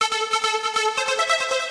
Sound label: plasma, 140bpm keyboard; hous-tec